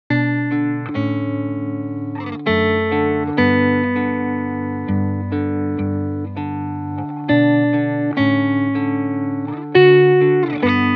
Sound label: Guitar Dry